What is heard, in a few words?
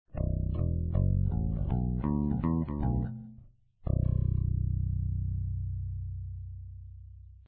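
both pickup pick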